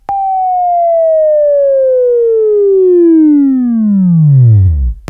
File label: Buzz, Left; Sweeping Training